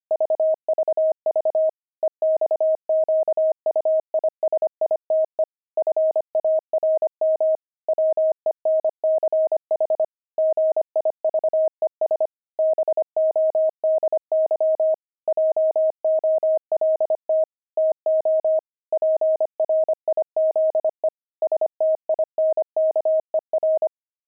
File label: pangram, intermediate pangrams files; jolt